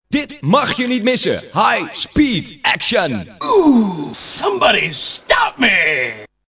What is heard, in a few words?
Jingle Highspeed action; kermis; Sound Around